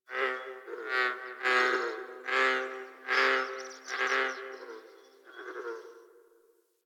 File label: Night Zone Tree Critters; Warframe; Ambience; Eidolon Plains; One Offs